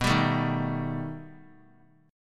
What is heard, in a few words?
B Augmented 7th B down; strummed; JGuitar